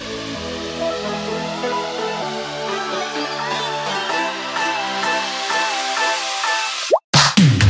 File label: Bubbles